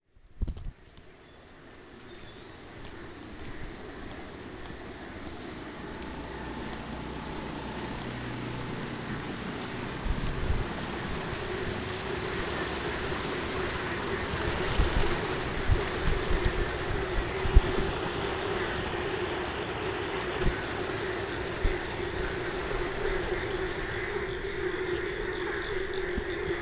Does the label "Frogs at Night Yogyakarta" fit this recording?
Yes